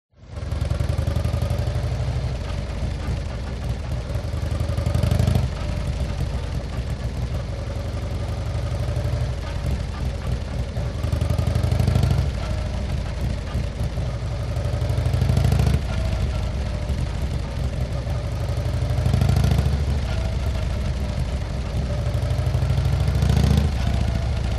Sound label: TRANSPORTATION MOTORCYCLE Harley Accelerate Multiple Short